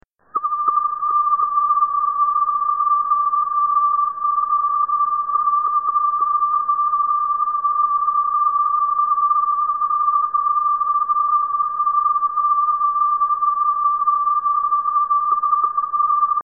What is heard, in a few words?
Laser with Feedback Facet + Fiber; Light Rock